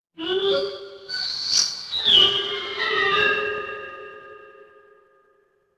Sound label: horror creak